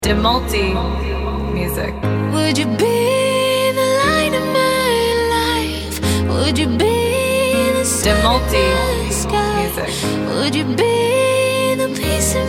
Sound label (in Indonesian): edm, house yang elegan dengan vocal wanita